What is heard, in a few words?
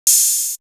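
Hi-hat; 90 sSampleCDs; Coldcut's Kleptomania VOL1; Drum One Shots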